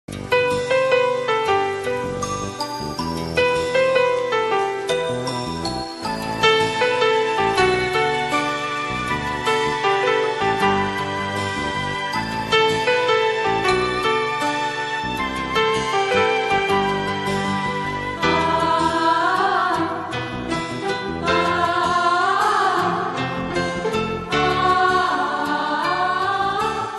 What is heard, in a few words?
romantic ringtone, instrument; shahrukh khan; kajol; rain dance